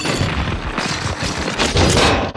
repair; London Taxi; PC Joker: Gamebox

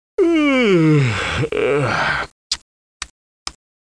blizzard warning; HOLIDAY

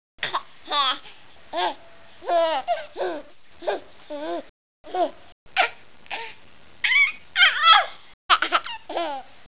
baby

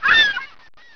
SEAGULL; animals; Story Maker

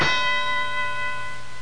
match

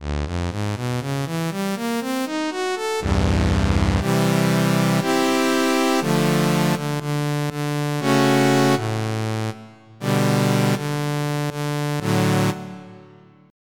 Violin; Onj's Soundfont

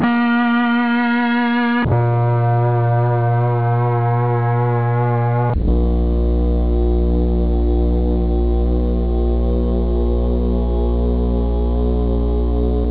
blues; guitar

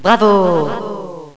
kit; Villagina; ecole

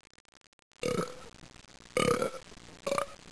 Man Belching; Soundsation; Human

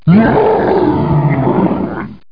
ROAR; The Ultimate Guide to Mortal Kombat

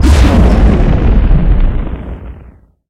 engineer die; darwinia demo

